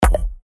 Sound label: UI invalid sound